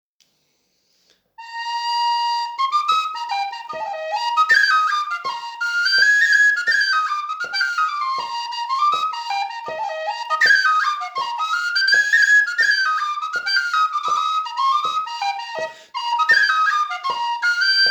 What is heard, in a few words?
Hornpipe; Alexanders